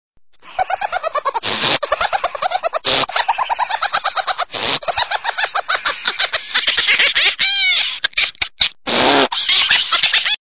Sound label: Hilarious Army Laugh